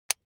ui button electric drill direction switch lock heavy